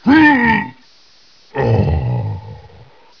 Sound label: scale death